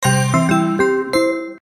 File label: win; Hot Fruits 20